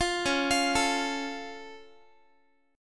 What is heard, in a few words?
C sharp Augmented Guitar; arpeggiated; 20,21,20,19,x,x